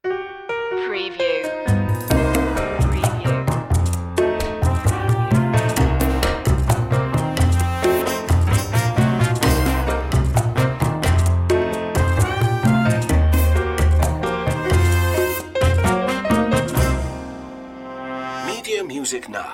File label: jazzy opener variation